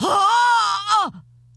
Wound; Creature; Auriaya

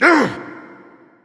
Hood Wolf Attacks

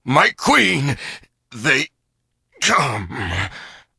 Death; Keleseth

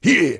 Attack; Voljin

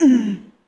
Wound Light, Creature; Eydis

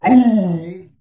Troll Wound; Trollgore

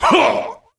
Wound Heavy; Varimathras

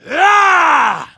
troll attack; Halazzi

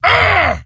Wound; Kologarn

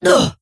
Wound; Tenebron

